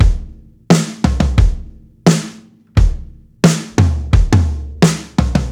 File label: TOM GROOVE, breaks, drums; Basement drums vol 2